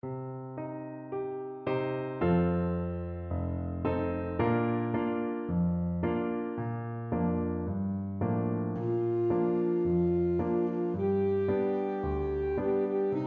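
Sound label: fado alto saxophone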